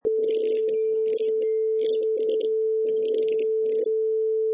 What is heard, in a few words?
Winding Up Music Box